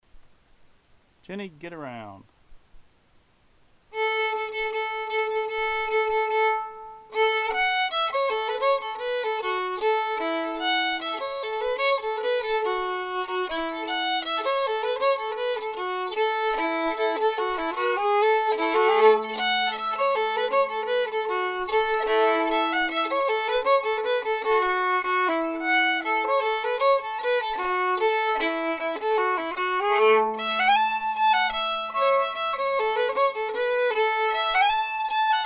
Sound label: fiddle; festival tunes